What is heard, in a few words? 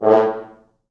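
4 French Horns, Horns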